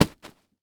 Sports Bag Sleeping Bag Folded Dropping On Cement

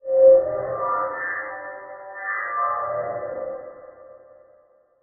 Production Element Title Transition Bed

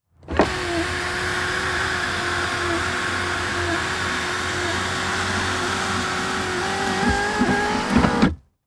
Auto Saturn Close Sunroof Interior; Transportation

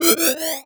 Animation Cartoon; Multimedia Internet CD-Rom Flash

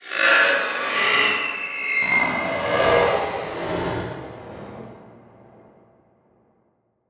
Transition Whoosh Multiple; Production Element

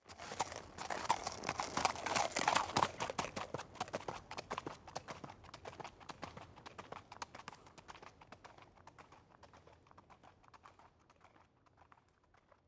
Animal Horse On Gravel Trots Away; animals; AdobeCC

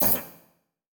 Multimedia Internet CD-Rom Flash Pop Up Short

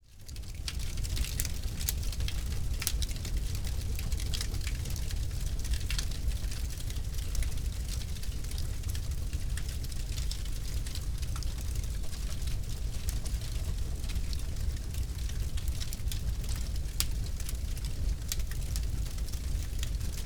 Fire Fireplace Pops Crackle; Fire and Explosions

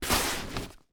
Sports Bag Duffle Bag Slide Cement

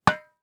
Tennis Ball Bounce Off Racquet; Sports

